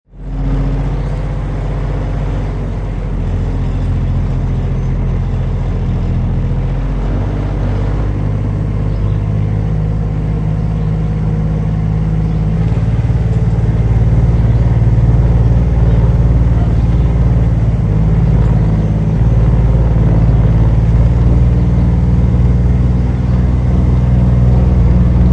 ATV Birds, ATV driving in woods with birds in background; ATVs (Sound Effects:Vehicles)